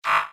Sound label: answer wrong; RallyX